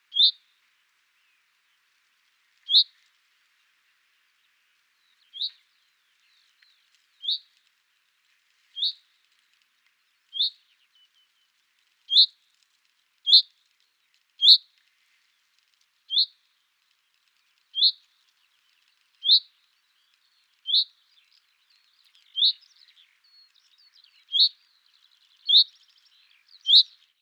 Paprastasis Kikilis Common Chaffinch - Fringilla coelebs gengleri